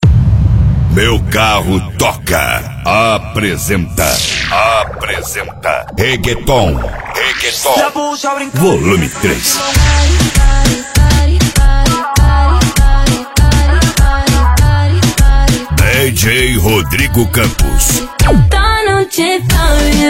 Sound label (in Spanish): Reggaeton